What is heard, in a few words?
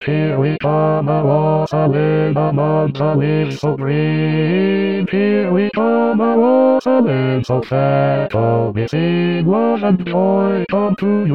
Bass; Here We Come a wassailing